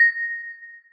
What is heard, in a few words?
celesta, programmable speaker